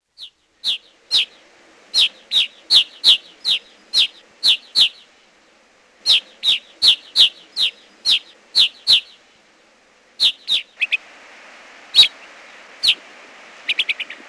grey crowned rosy finch

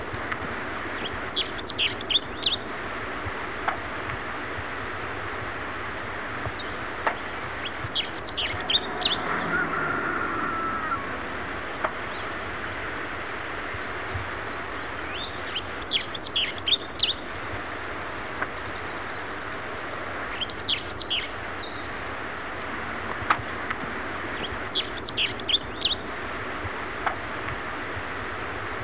chestnut breasted mountain finch

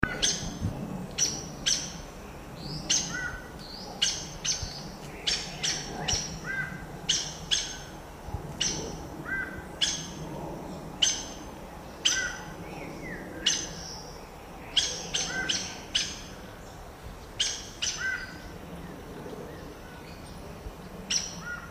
saffron toucanet

